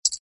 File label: chip land